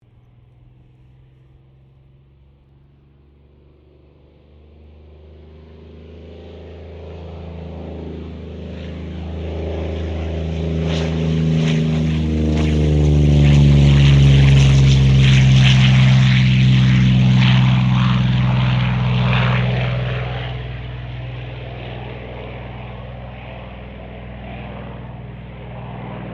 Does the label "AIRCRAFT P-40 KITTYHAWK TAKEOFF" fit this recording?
Yes